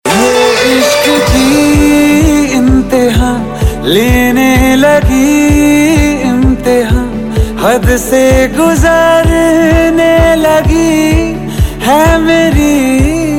Hindi Song Ringtone

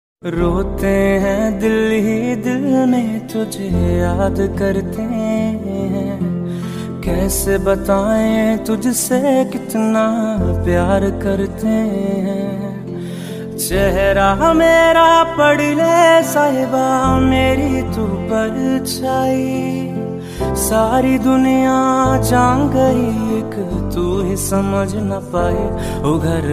Old Hindi Song Ringtone

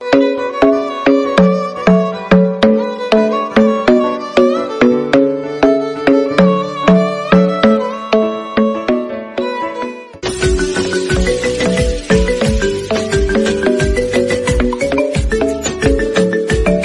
Music Ringtone

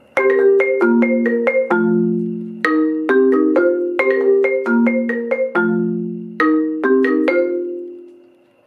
Apple iOS 11 Ringtone; ringtones

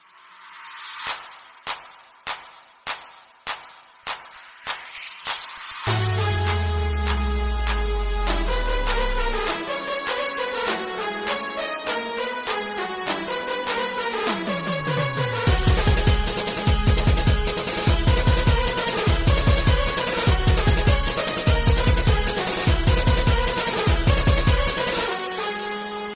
Bgm Remix Ringtone; Rowdy Hero Maari

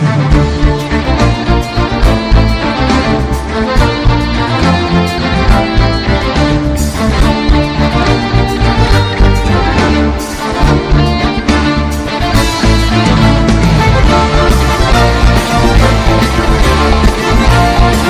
Violin Music Ringtone; Pirates Of The Caribbean

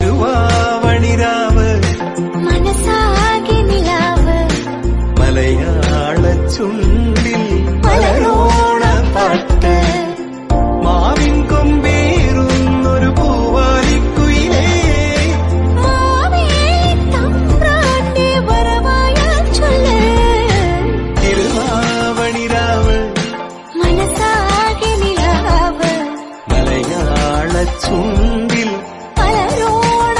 Malayalam Love Song Ringtone

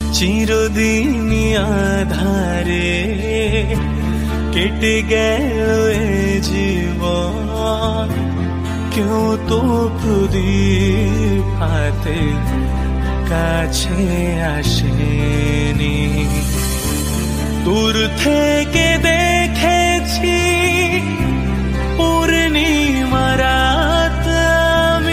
Bengali Sad Song Ringtone

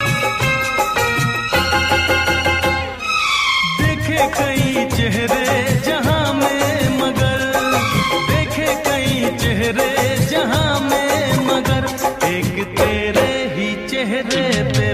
Old Ringtone

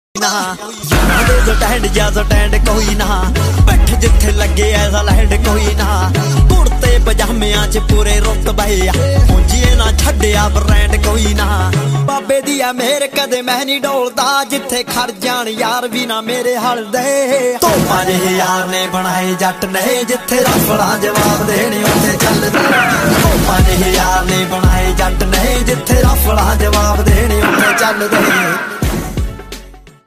New Punjabi Song Ringtone

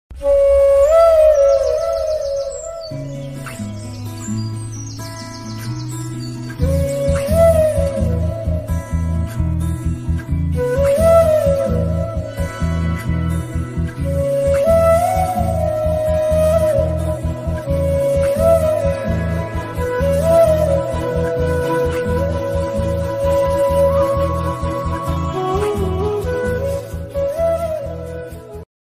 Flute Music Ringtone

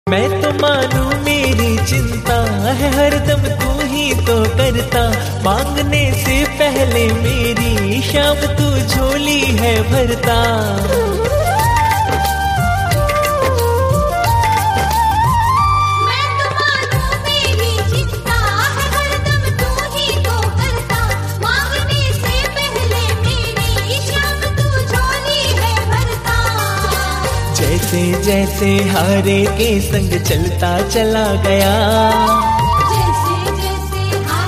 Bhajan Ringtone; Khatu Shyam Ji

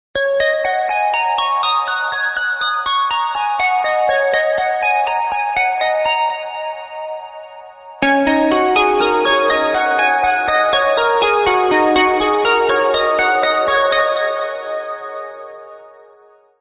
Loud Ringtone For Alarm Tone; ringtones

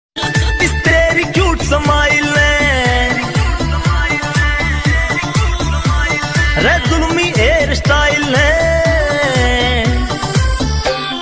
New Indian Ringtone

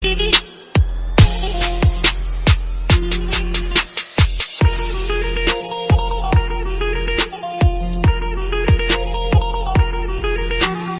Turkish Villain Trap Mix Music Ringtone; ringtones

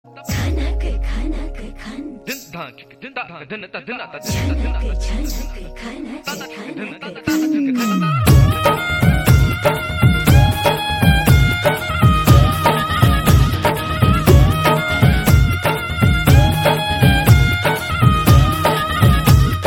Music Ringtone

